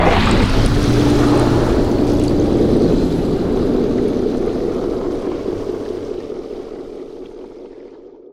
sprint layer; weapons; dota; slardar; ti9

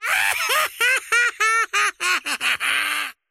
wheel laugh; dota; largo

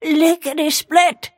move pain; snapfire

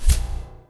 psi blade; templar assassin

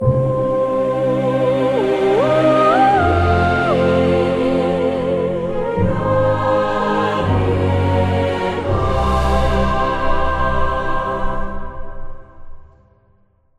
lose; stingers

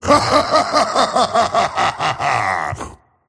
laugh; tiny

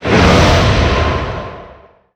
dragontail cast; weapons; kindred; dota; dragon knight

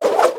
boomerang cast; weapons; dota; hoodwink

